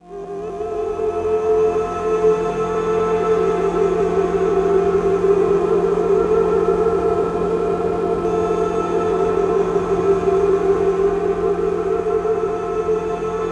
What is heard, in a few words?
cinematic textures and drones